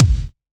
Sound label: Kick, Drum Hits